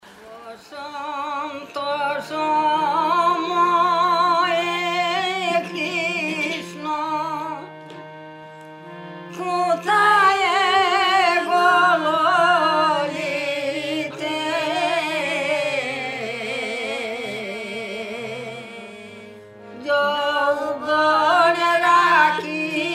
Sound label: Song